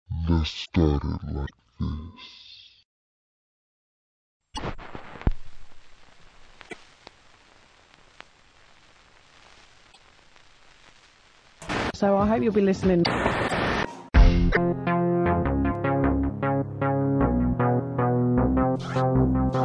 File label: Scratchbeats